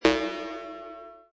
Space Scifi Sound Oops, Strange sci-fi sonar or polling; Strange sci-fi sonar or polling instrument; Lynne Music